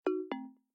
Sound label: HAPTIC SEC UNLOCK FAIL, ui; sharp audio